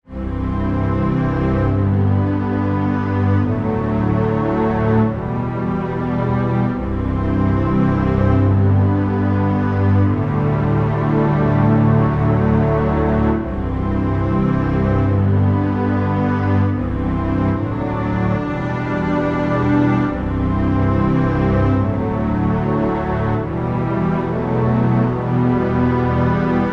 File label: Underscore PAD; Worship Flow